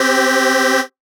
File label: Techno Synth Shot C; 45 Techno Synth Shots 2025; Synth One-Shots